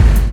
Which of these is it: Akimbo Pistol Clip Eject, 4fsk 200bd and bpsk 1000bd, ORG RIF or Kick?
Kick